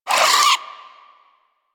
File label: vocal attack, critters; Warframe; Hunting Critters; Nexifera